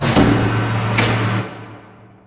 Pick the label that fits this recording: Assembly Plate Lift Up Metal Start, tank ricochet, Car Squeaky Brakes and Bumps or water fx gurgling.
Assembly Plate Lift Up Metal Start